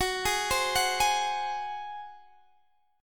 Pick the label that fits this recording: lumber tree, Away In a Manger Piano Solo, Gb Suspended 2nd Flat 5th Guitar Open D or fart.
Gb Suspended 2nd Flat 5th Guitar Open D